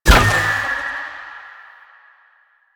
Choir Bow Fire; Warframe; Weapons

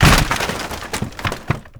Wood Crate Destroy; Warframe; NineteenNinetyNine

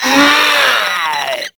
Infested Runner Attack; Warframe; Runners

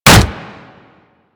Kick Hit Default; Melee

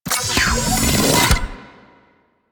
Sniper Rifle Alt Charge; Warframe; Weapons; Corpus

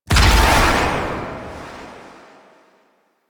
Blade Explosion; Enemies; Warframe; Warframes; Pax Duviricus

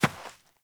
Fawn Step; Encounters; Animal Rescue

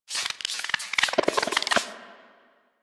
Robo Fish Medium Caught

